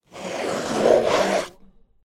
drawing on paper

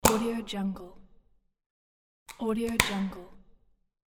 spray paint can lid open and close

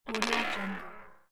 error

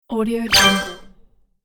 digital game achieve

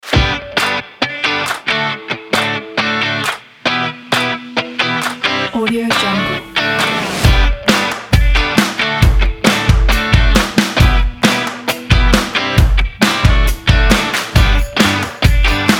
upbeat music

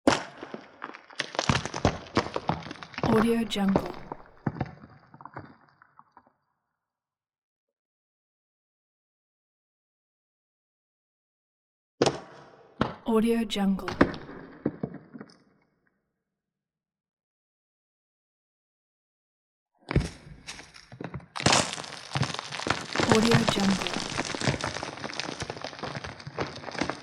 rock fall crunchy debris scattering

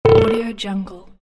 button menu application; archisound

